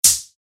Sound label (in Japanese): ムチがぶつかる 通常攻撃